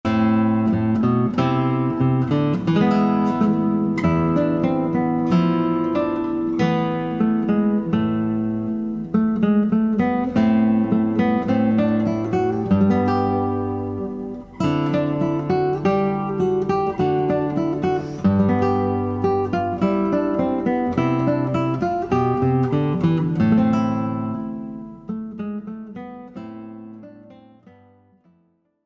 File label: Pavanas